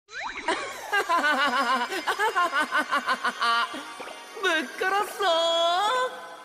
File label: Ringtone